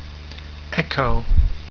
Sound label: echo